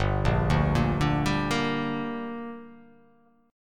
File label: G Minor G down, chord